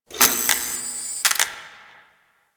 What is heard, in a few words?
Derelict Light Flicker; Ambience; Props; Warframe; Orokin Derelict